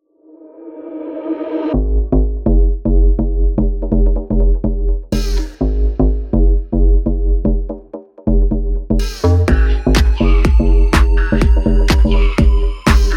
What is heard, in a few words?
Instrumental